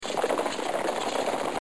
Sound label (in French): Cheval